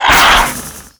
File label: attack, antlion; creatures; vox